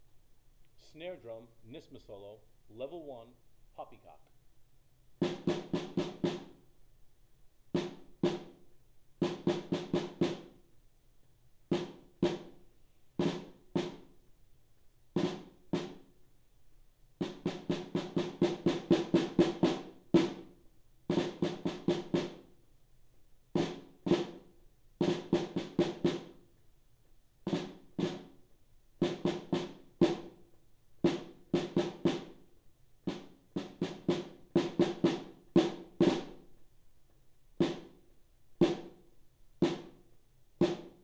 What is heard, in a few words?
snare drum solo; nyssma